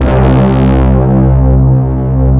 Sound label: BRASS MIX C1; AKAI S-Series CD-ROM Sound Library VOL-2